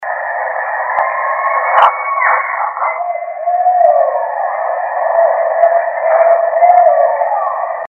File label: BARRED OWL SCREAM